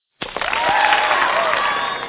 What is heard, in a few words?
clap; earth universe